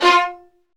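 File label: strings violins; L-CD702; Vlns Marc4-5; Vls Marc4 x8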